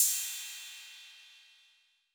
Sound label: Cymbal 808 Decay, Clean Digital